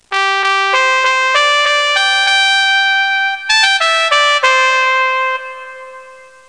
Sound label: trumpet; music